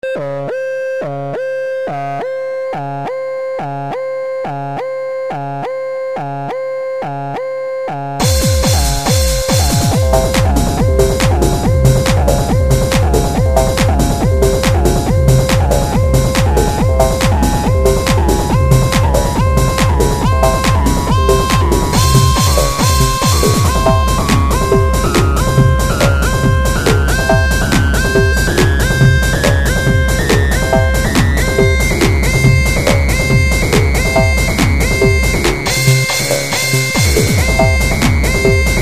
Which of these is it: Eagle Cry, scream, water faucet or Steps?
scream